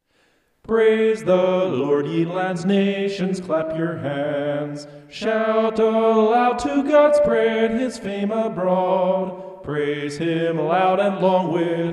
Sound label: vocal homoph; psalm 47